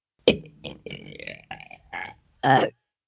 Burp Drawn Out, Burp,1 child, long, drawn out, gross, human voice, kid; Burping; Voice Box